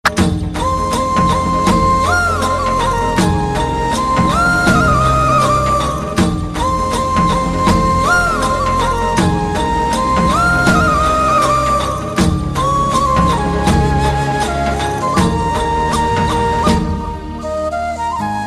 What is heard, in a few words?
Best Flute Ever